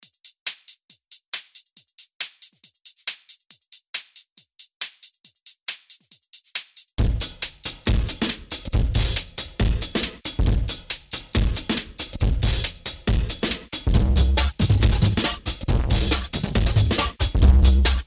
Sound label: Marimba